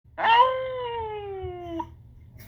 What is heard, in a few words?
howl